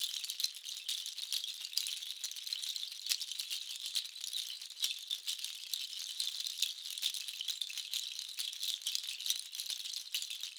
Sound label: Deertoe shaker; Warrior (Tohoqashaw)